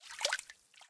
sewer; ruina

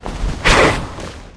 peryton attack, monster

